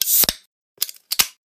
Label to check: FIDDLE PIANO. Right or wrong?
Wrong